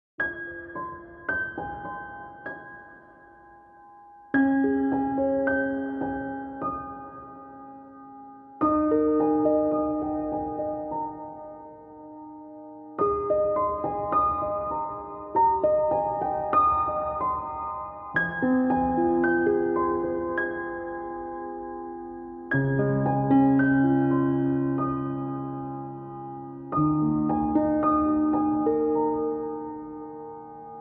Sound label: Sad Piano